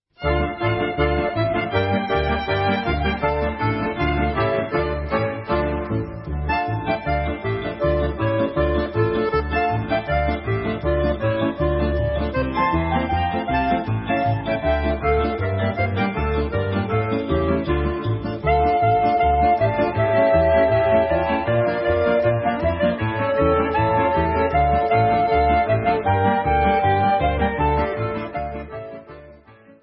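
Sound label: Russian Instrumental, Israeli Dances; Troika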